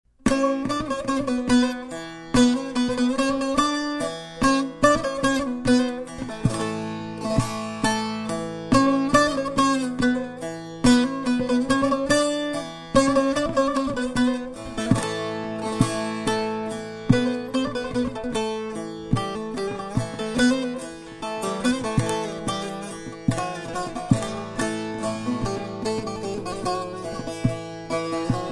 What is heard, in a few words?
saz; dut saz